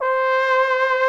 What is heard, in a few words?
VIBRATO TROM